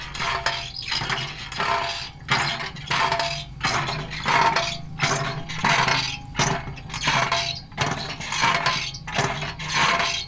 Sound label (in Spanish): plaza de la madre; puerto gaboto